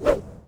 throw

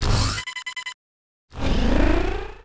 power Up; Star Wars Obi Wan; XBOX

